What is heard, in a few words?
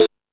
High Hat, Drum Machines E-MU Pro-Cussion